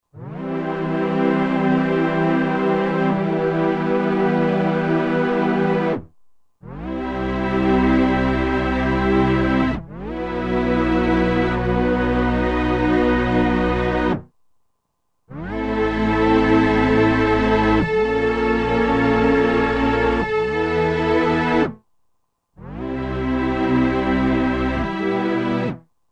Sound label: Tape Orchest, Roland SR-JV80-16 Orchestral II